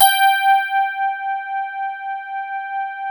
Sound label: DIGI RHODES; Sound Gallery - Charged